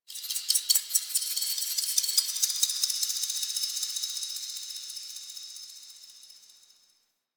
High Texture, Ambience; Warframe; Quests; TheNewWar; Zariman

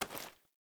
Generic Pickup; Objects; Pickups

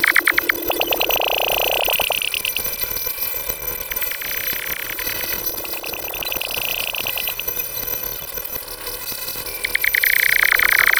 Camera Console Loop, Ambience; Venus; Warframe; Veilbreaker; Kahl Quest

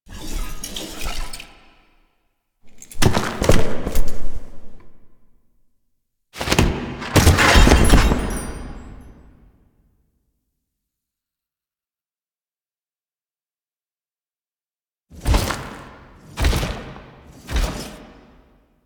Throne Room Foley; Intro Throne Room; Warframe; The New War

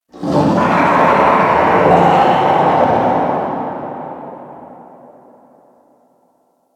Infested Distant Creature Snarl, Ambience; Warframe; Corpus Infested

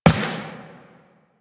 Step Glass, Footsteps, Walk; Warframe; Tenno

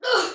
Pain Soldier Female, Battle Barks; Dialog; Sinecure

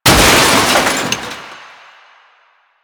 Car Metal Smash; Warframe; Ambience; Nineteen Ninety Nine

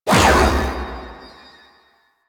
Whirlwind Cast Stop; Warframe; Caliban

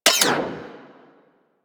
Mech, Weapons Rifle; Drake; Tiberon Prime

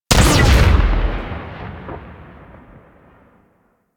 Sniper Rifle Alt Shot; Warframe; Weapons; Corpus

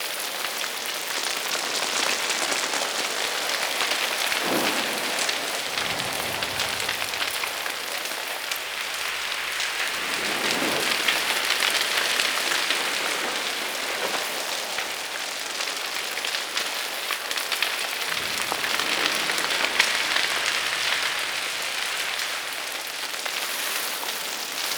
Forest Distant Fire Loop; Ambience; Props; Warframe; Grineer Forest

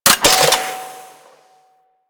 Flak Cannon Mech; Grineer

